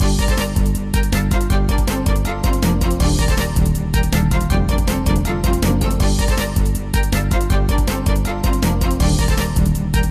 BGM Attack

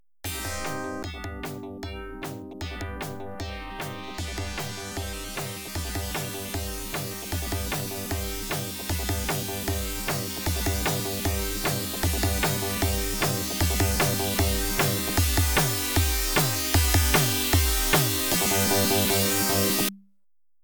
Catwalk Timer, pinball

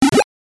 Coin; Pac-Land; X68000

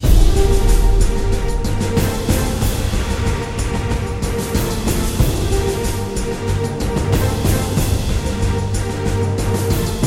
Battle; MU Legend

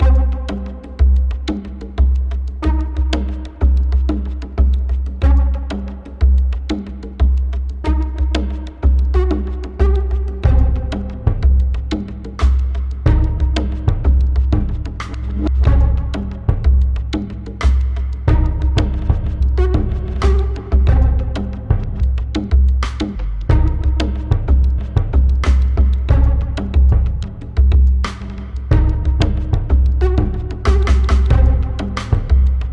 music level

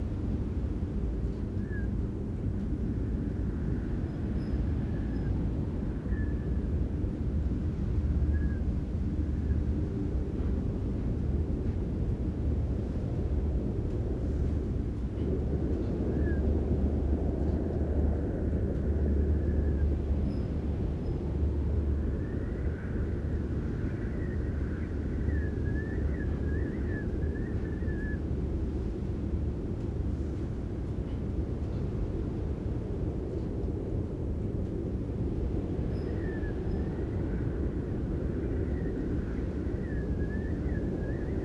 Ambient

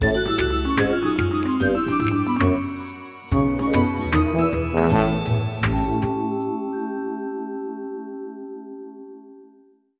Minigame Peng Lose; Ice Age 2 The Meltdown; Wii

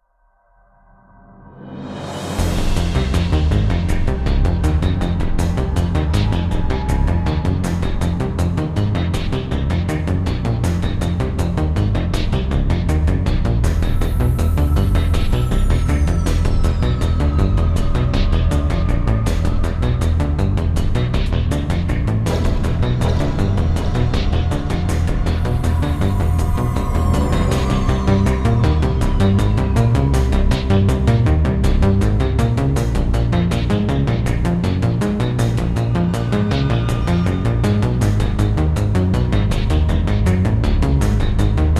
Heavy Mech Fight (Low); Mass Effect 2

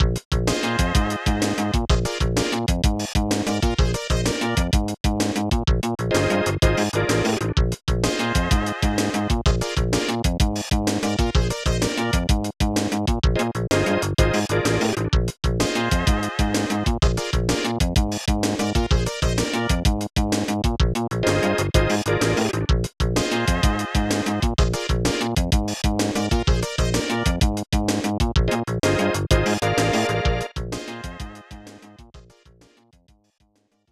Victory; Adventure Island Super 2

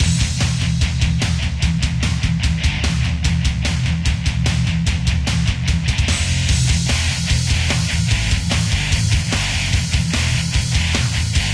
Metal Music; android

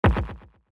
Sound Impact; drop wizard gamerip

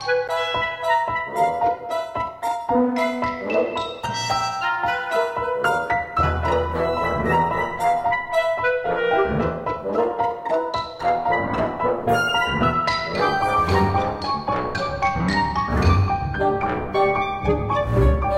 Quirky

